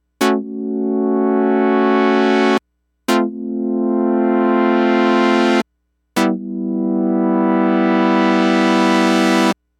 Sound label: Chord Juno6